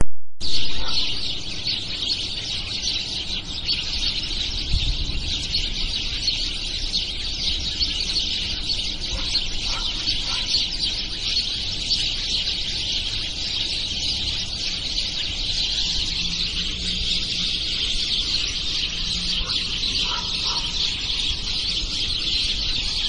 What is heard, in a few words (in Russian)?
vorobey